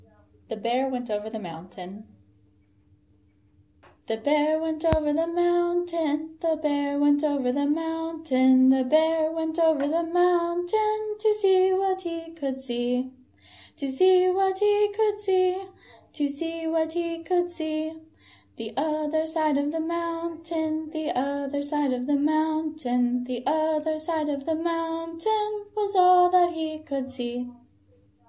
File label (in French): chant